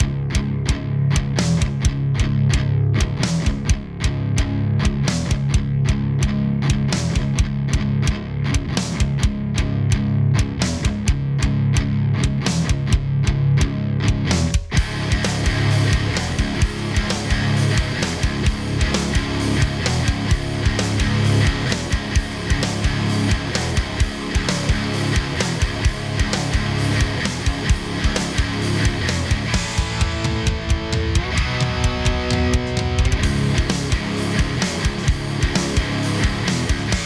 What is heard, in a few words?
Guitar Djent